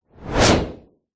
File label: sound design whoosh transition swoosh med powerful short fast